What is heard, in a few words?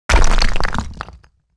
stone crash mid; World of Tanks Blitz